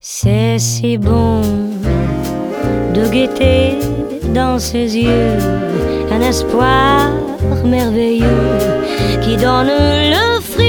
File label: Music Only